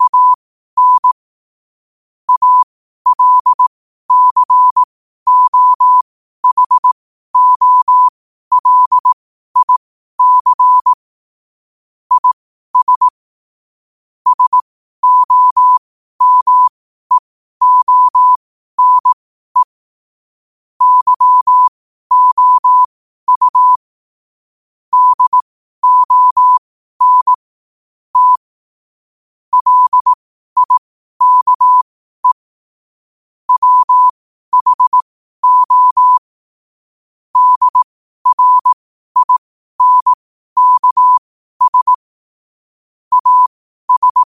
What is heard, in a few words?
5 wpm, morse